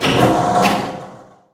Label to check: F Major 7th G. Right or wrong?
Wrong